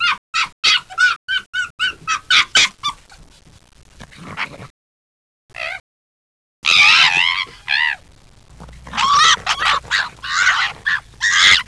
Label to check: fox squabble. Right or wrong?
Right